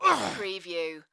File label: Male Grunt, Human Injury, Pain, Vocal Ugh, Ouch